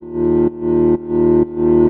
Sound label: Deep Bass Loop